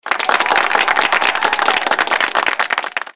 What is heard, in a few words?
clapping